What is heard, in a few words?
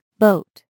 boat; Mono